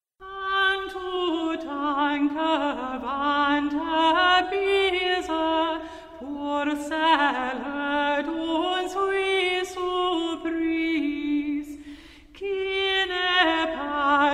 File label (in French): chanson medievale; blondel de Nesle; vente bise; musique moyen age